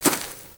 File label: Footstep; Lego; Strombie; creatures organic